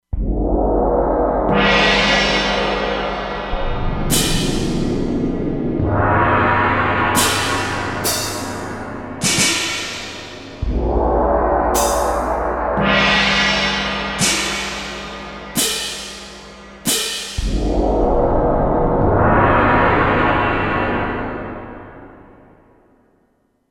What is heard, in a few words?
Gong-Cymbal; Factory Presets; E-mu Proteus-2